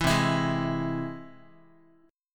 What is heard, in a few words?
C Augmented down Guitar; strummed; JGuitar